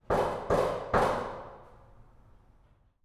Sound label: door knock; prosoundfx